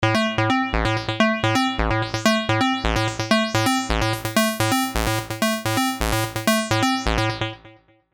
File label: Acid Loop 130 A (128 BPM); Sylenth1; Red Moon Blues